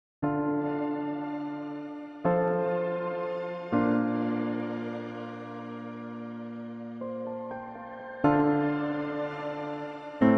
TAILS WET

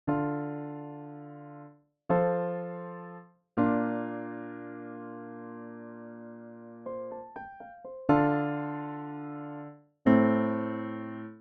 TAILS DRY

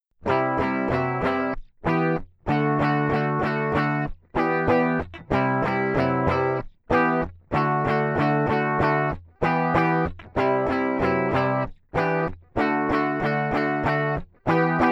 Rhythm DI

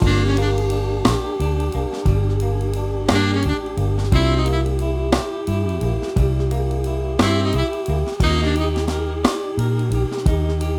Live Room Saturation After

